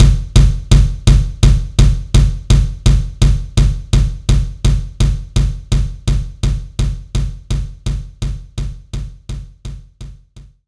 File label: Gated Kick